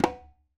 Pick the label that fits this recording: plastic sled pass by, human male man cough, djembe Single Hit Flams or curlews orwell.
djembe Single Hit Flams